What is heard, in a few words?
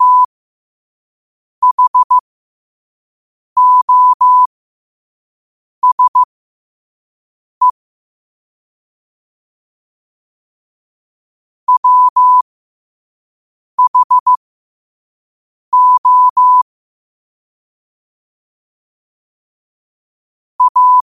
5 wpm, morse